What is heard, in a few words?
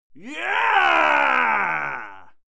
male fight scream yeah; menschen; schreie